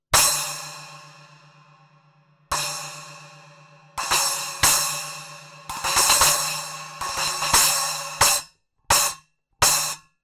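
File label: Splash Byzance Traditional 8, cymbales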